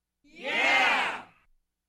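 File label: joyful approving exclamation of small group; radostnoe odobritelnoe vosklicanie nebolshoj gruppi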